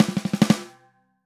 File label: Snare Roll